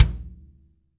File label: kick, Multisampled Real Kit; REAL LIVE KITS